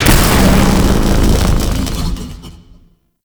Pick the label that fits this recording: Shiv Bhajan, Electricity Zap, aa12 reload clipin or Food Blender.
Electricity Zap